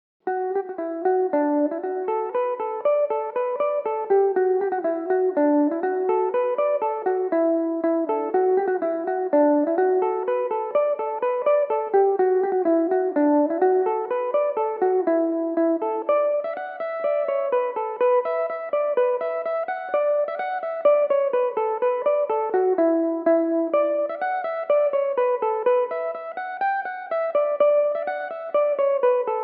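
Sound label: E dorian, irish mandolin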